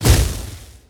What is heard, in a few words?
beast lava ball rise